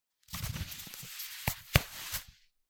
ジーパンのボタンを止める音

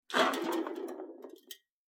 空の缶が転がる音

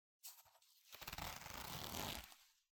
ジーパンのジップを上げる音

